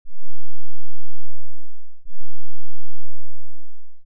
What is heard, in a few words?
mosquito